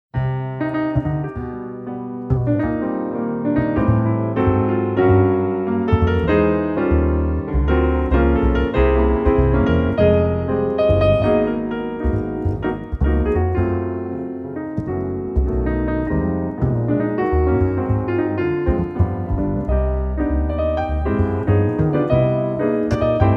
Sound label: ewi piano duet